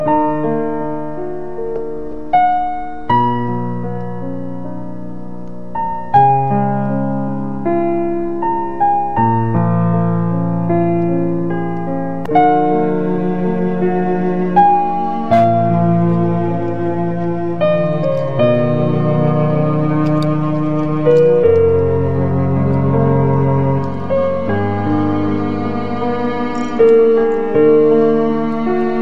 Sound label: romantic piano